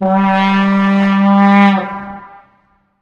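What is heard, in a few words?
vuvuzela; source engine; tf; football manager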